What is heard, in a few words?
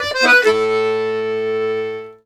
Accordions; USB Soundscan vol.40 - Complete Accordions; AKAI